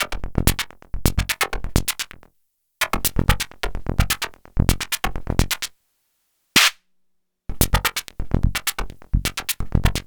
Hats High

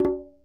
Conga, World Percussion One Shots; Sony CS

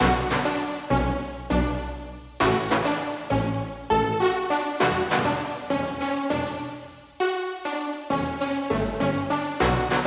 Brass Loop 100BPM Bmin; 99Sounds x Monosounds; Instrument Loops

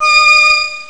disc string dur; ST-XX Amiga Soundtracker Sample Packs

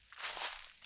Rustly Step, Footsteps, outdoor

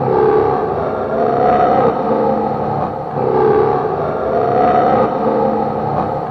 EVP Chant; Sonic Foundry (Sony Creative Software); Loop Noir

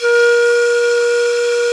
FLT, Sicuri Pipe; Club-50 - Foundations Roland; Flutes +

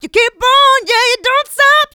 Female Vocals; Houseworx

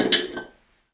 Finger Hit, Prepared Kit; Battery 3 Sample Kits

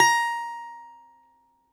BALALAIKA, Russian Strings

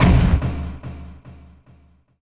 END, Tekno Industrial; East West

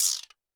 Roland TD-20 Percussion

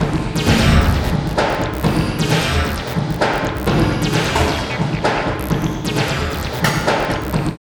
LP, Tekno Industrial; East West

